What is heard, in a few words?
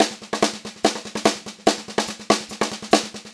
144 SPEED, Pop-Rock Drumloops; USB Soundscan vol.37; AKAI; 90 s Sample CDs